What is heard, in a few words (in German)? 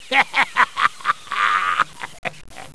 lachen; de scheiterhaufen2